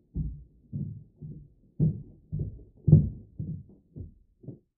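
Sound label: Footsteps Male Walking Thro, stairs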